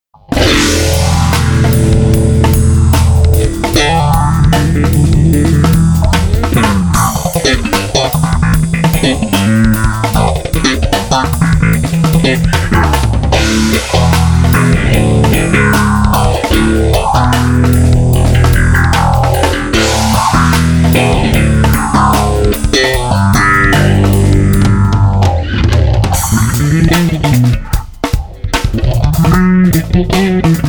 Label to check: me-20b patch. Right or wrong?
Right